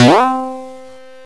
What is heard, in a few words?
Boing; Sonic